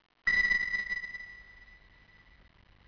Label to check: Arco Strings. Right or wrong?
Wrong